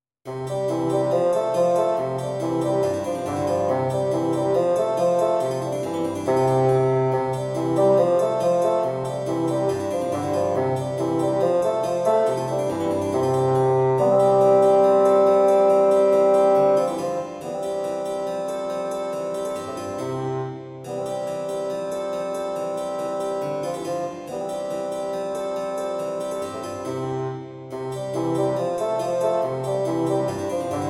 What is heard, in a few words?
bassoons harpsichord; "Bassoons and Harpsichord" is a short fun piece in a pseudo-Baroque style for two bassoons